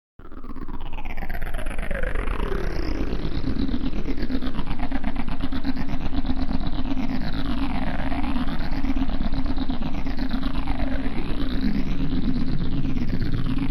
Stuttered Drone, Stuttering eerie drone transition.; Drones (Sound Effects:Sound Design)